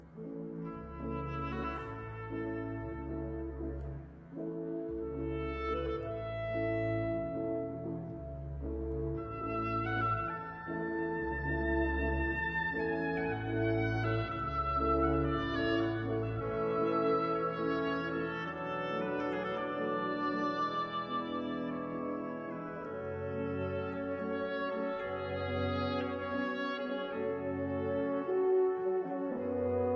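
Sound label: Serenade in D minor, Andante con moto, wind ensemble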